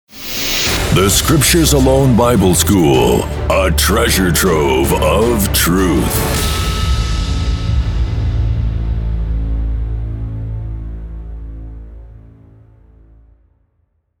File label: jingle